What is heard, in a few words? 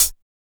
Closed Hihat, Cymbals; Closed Hihats